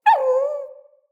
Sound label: Wolf Cub Trapped Call Close; Animals; Wolf Cubs; Orengal Wolf Cub B